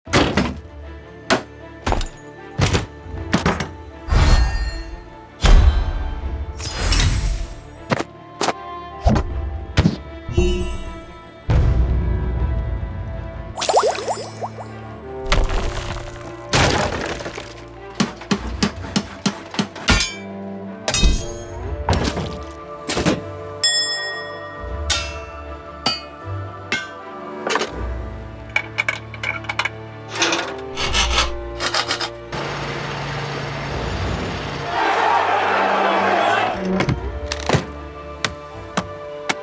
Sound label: craft rts sounds